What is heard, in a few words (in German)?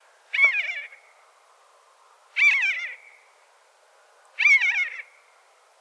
maeusebussard